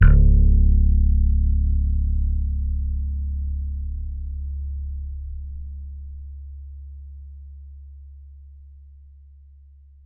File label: T-BIRD BASS PLUCK C#1; Bass Mace; DirectWave